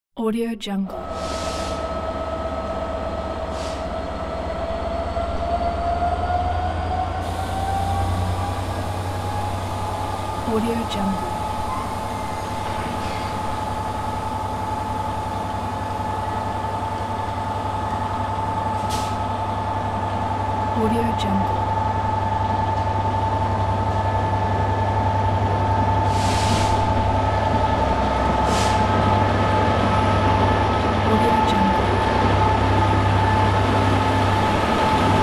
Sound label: commuter train